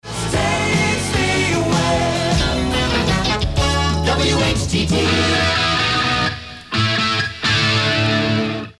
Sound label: jingle; whtt